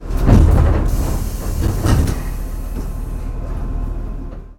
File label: KOMINATO DOOR CLOSE